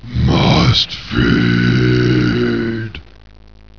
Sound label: kill; svencoop; hunger; hungerbarney